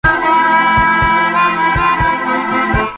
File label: melody